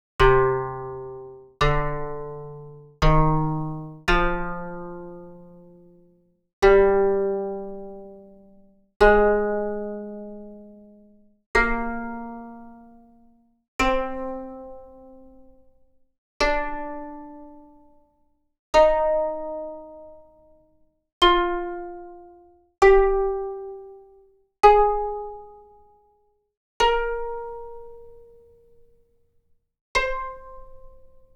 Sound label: box harp